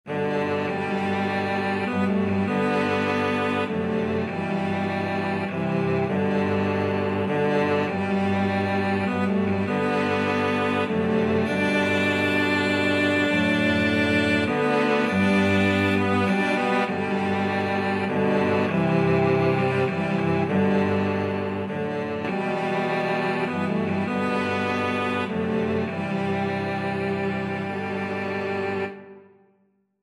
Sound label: cello quartet